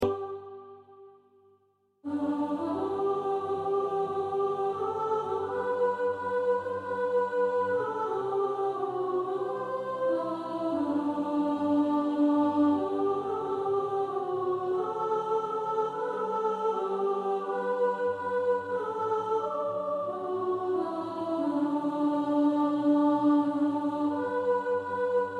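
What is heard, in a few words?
soprano, practice music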